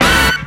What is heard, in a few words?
woomy, hits; RoBKTA Sample Pack Supreme